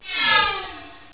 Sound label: laser